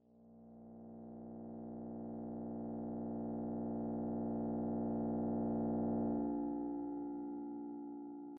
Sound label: ambient scape; Dystopia; meatspace